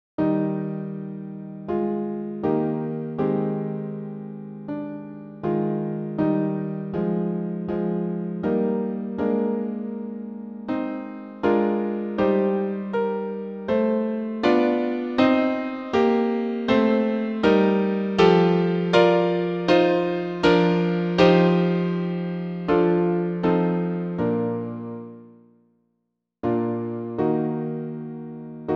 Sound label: peace I leave with you piano